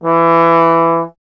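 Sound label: bass trombone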